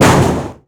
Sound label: Metal Fence; Totaled; XBOX